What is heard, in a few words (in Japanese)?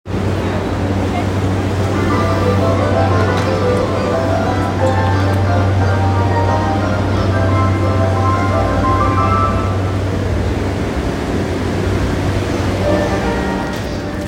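JR京浜東北線，山手線東京北 counter-clockwise, train sounds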